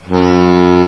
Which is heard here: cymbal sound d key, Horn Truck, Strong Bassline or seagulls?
Horn Truck